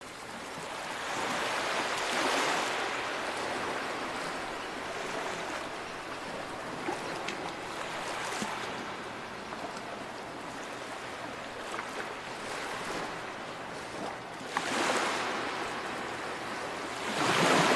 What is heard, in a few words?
waves